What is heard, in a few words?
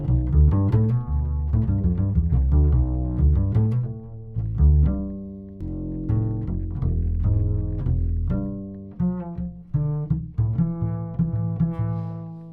Double Bass Lo solo